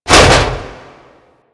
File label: Frame Step; DE; Warframe; Vay Hek